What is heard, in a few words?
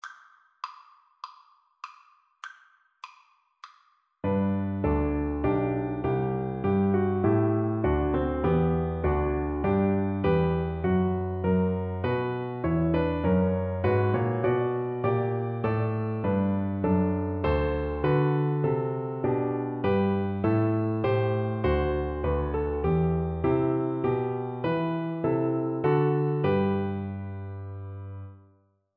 heard the bells viola